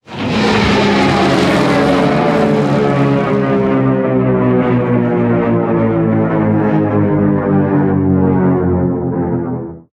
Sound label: damage vehicle; World Of Warships